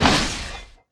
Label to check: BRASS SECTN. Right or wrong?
Wrong